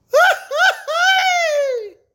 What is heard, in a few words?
Grito Mexican Ringtone